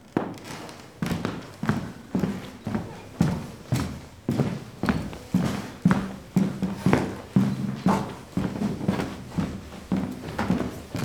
steps on wooden floor; a dump in the dark

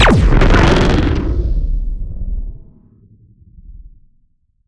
Shot Large Blaster Proton Burner

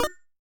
Coin

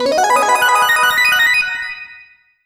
Next Lap Fanfare Variation; Mario Kart 8

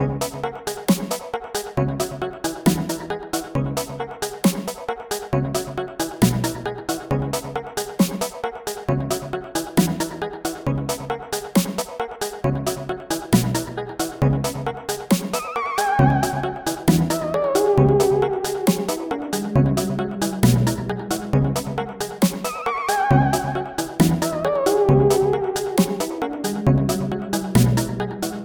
loading, loop

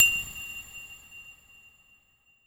SE KANPAI

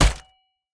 Baseball Bat Hit

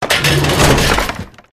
Prop Ladder Drop Wood Metal

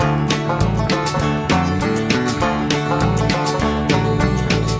Hillbilly Bootstomp

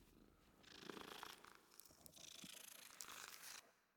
Creature; we are the dwarves gamerip 2016

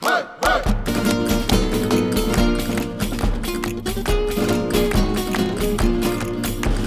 music world music shooter swarm

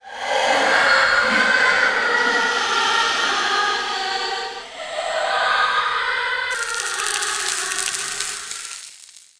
Book Burning; Dark Dimensions City of Fog

